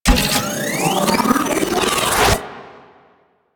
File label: Charge Up; Warframe; Weapons; Corpus